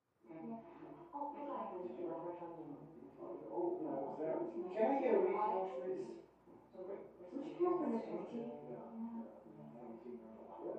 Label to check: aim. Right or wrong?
Wrong